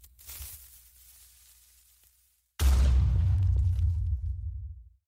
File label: creeper